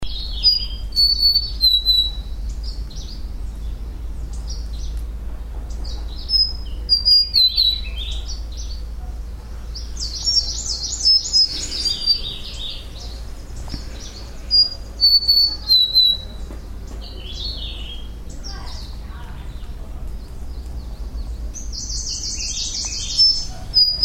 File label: warbler large-billed leaf Phylloscopus magnirostris